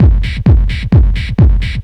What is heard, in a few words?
techno, DRUMLOOPS